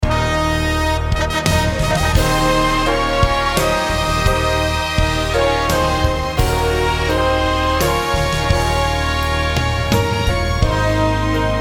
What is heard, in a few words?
Star Spangled Banner VOCALS; Black Tie America; Group Practice Songs